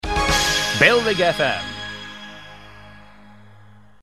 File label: step up sting; bfm; bailrigg fm; bfm jingles ads RSL 1994